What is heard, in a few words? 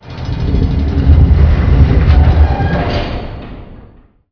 Mech Fx; dm clocktower